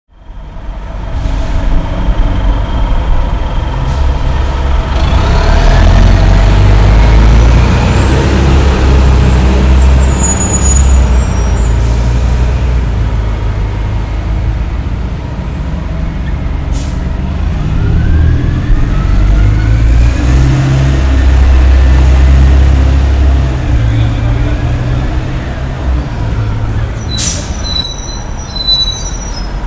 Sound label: Street Noise Soundscape